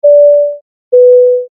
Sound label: Airplane Chime Ding Dong